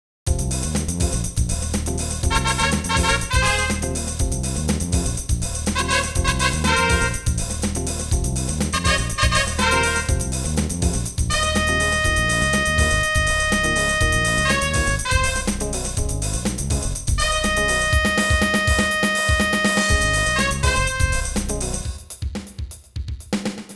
concerto

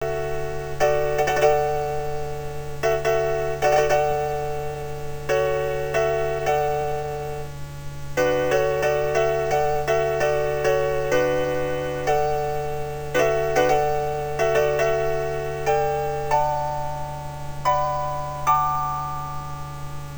concerto

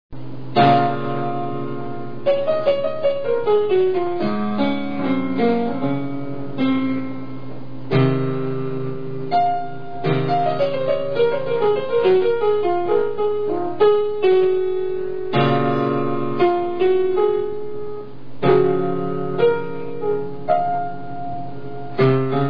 piano

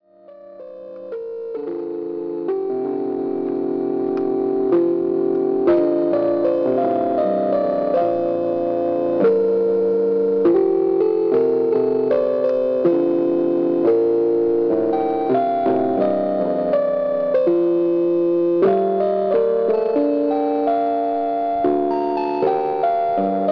piano

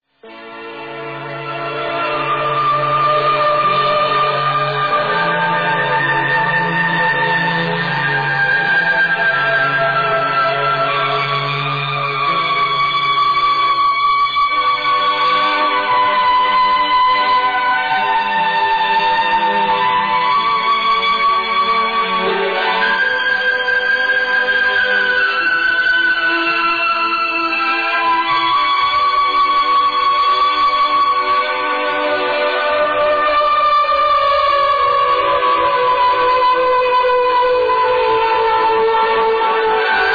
piano

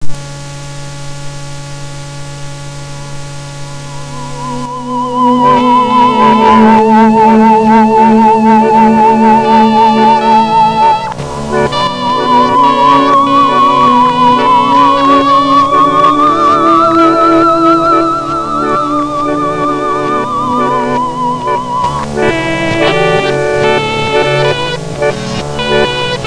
concerto

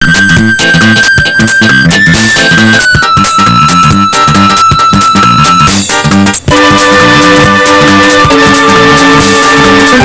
concerto

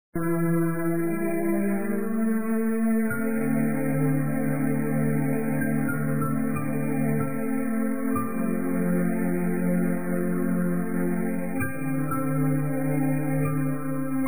concerto

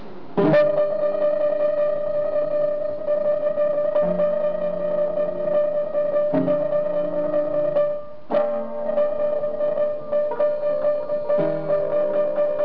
piano

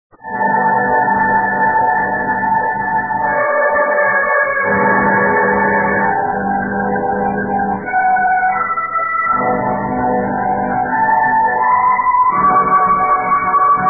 piano

